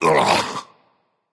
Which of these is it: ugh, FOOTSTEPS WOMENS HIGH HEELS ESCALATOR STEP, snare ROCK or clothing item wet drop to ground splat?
ugh